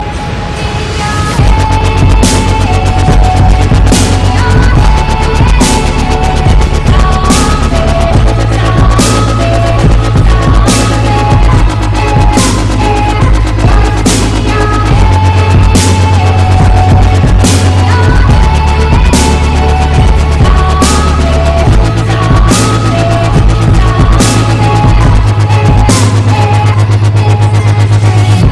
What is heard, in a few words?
DUBSTEP; OD Zombies